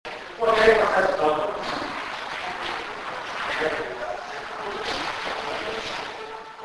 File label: strange cry